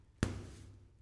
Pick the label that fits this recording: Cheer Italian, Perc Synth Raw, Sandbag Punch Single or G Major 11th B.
Sandbag Punch Single